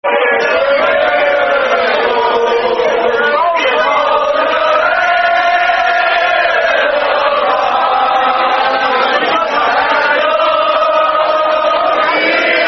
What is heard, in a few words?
Football Chants Liverpool; Liverpool Lfc